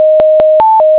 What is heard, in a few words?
jingle